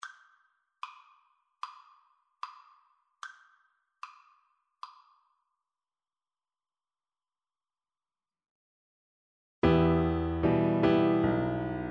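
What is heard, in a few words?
VLC karaoke, Cello; aint gonna let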